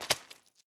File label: mud footstep